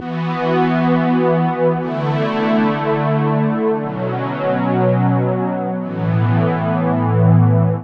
Low Analog String progression ( fifths)

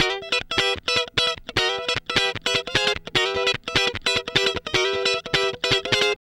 Guitar Licks

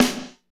snare R B, Roland snares

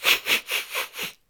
Mouth Fx, Vocal

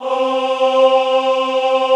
AHH C3, Classical Choir; Best Service; Extended Classical Choir

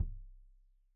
Kik Stereo; Deeply Sampled Drums

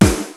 DRUMS; Rubber Chicken Software Sample Bank

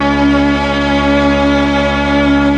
STR, STRING1+OBOE, M400; ILIO; Propeller Island Legendary

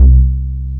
BAS, Eurotech; Sounds Good

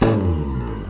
FRET ROLL, Electric Basses; E-MU Producer Series Vol. 8 – Platinum Phatt

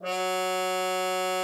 CUP MUTE F#3; Xsample VOL-3

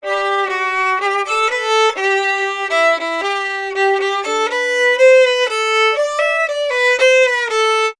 Fiddle, Appalachian

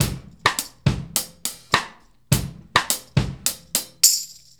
Straight Kit, World Pop Beats

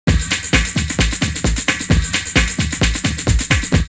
Flam Groove 131, drumloops Techno House; X-Static Goldmine

